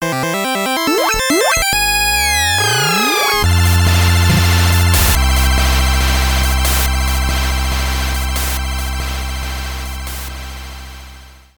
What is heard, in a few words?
jingle, lsdj; jingle technisches museum